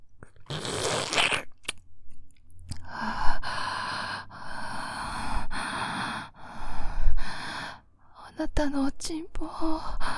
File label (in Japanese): ぢゅぽんっはぁ～